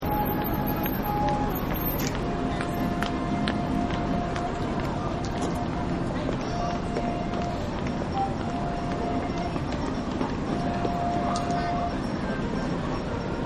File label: Street Ambience Tokyo; Cities; Partners In Rhyme